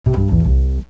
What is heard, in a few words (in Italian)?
Musica Uscita da Windows; W95